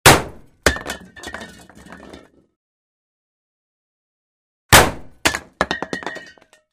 padenie predmeta na metallicheskuyu poverhnost

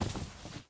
otdalyonnij priglushyonnij korotkij zvuk vistrela

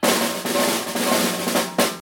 malij baraban melodiya barabannaya drob

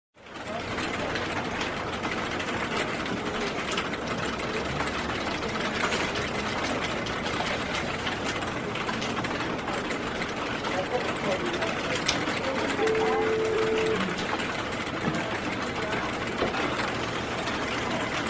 obshie zvuki ofisa. golosa zvonki rabota na kompyutere.

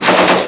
nizkij zvuk pulemetnoj ocheredi

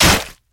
neostorozhnij smertelnij vistrel

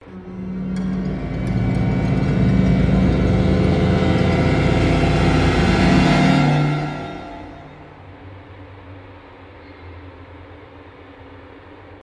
pugayushij trevozhnij moment

